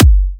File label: Electro Kicks; Bassdrums; Vengeance - Essential Clubsounds Vol.5